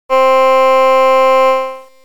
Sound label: Flute; envelope